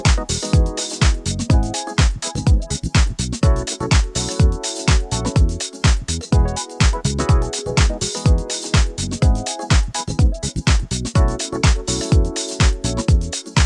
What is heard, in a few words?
house music with a disco bassline and soulful